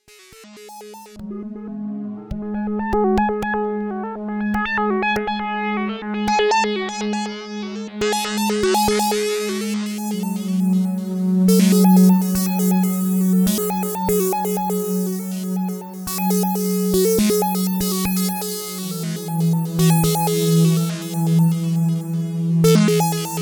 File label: prophet5 mix